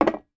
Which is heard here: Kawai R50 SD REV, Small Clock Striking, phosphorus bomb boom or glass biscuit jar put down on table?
glass biscuit jar put down on table